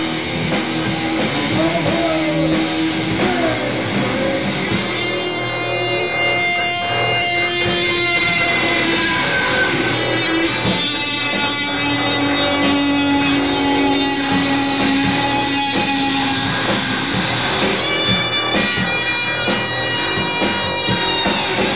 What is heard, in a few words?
guitar solo